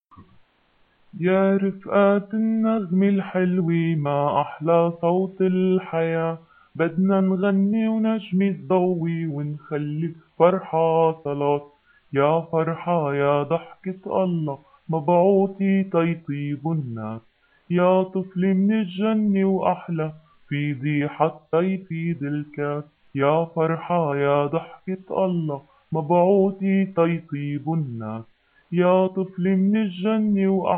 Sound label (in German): melodie tief